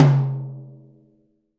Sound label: tom, Real Kit; 90 s Sample CDs; Sound & Vision - Gigapack I CD 1; Real-Kit s 4